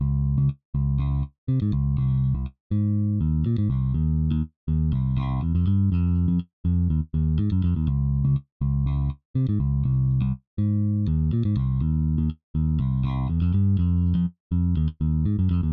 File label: Bass; Modarn J Bass